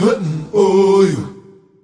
chorus hetum yoyo, African vocals sung

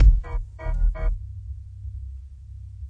deep one hit groove; SAMPLED MUSIC LOOPS

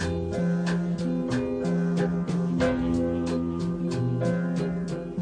092 ethiop, sampled music loop; SAMPLED MUSIC LOOPS

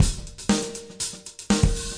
120 funky rock; 111 to 120 bpm

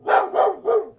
bulldog; Zoo and Nature

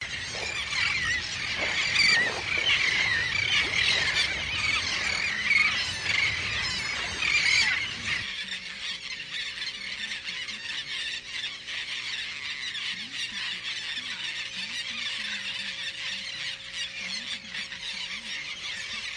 shorebirds; Zoo and Nature

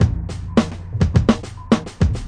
mellow, 105 bpm, drum loop

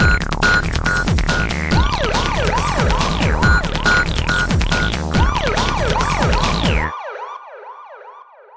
140 bpm, drum loops and breaks; 131 to 140 bpm; samples-ghost

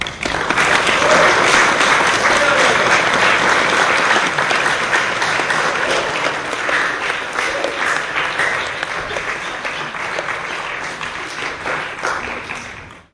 small hall applause; Sound FX Real Life

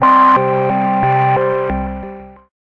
crunchy pretty synth

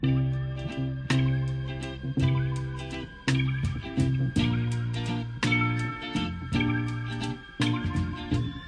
055 slow ballad guitar strings; SAMPLED MUSIC LOOPS

